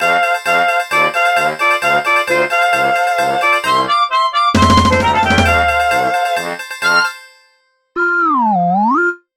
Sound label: Course Clear